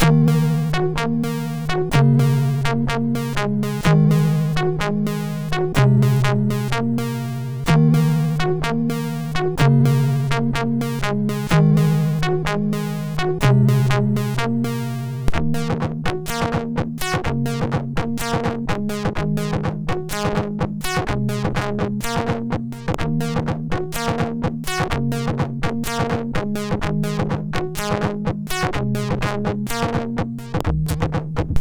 Music intro, MOS6581R4

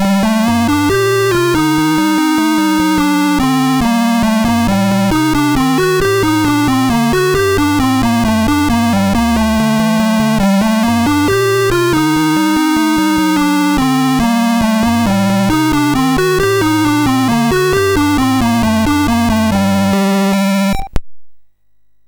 BASIC, MOS6581R3; Soundcontrol 2 Demo

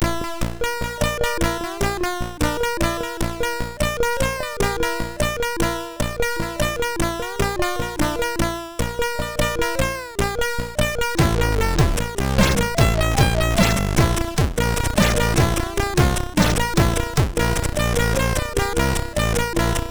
sid MOS6581R3

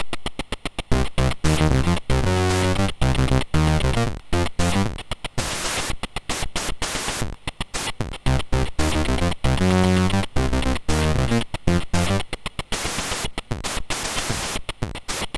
sid MOS6581R2; Snyder Tom; Agent USA

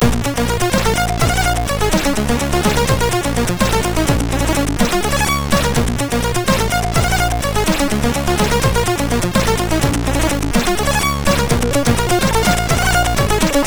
sid MOS6581R3

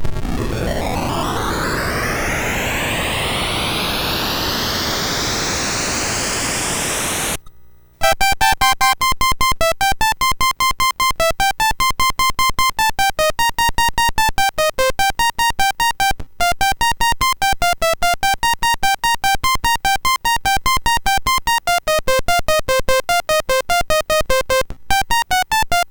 BASIC, MOS6581R4; Latimer Joey

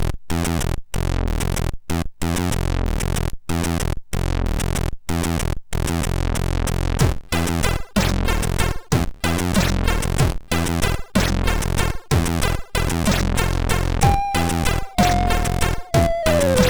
sid MOS6581R3

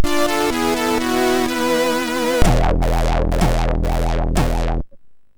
Double Sphere, MOS6581R2

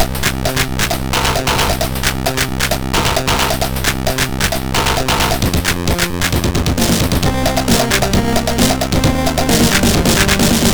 MOS6581R4, sid

